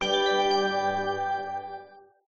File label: win star; gardenbloom